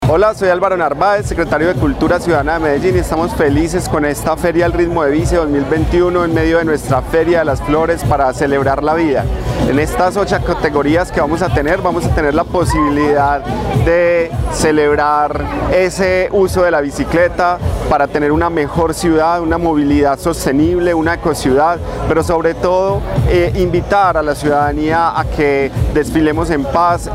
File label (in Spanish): Ritmo de Bicicleta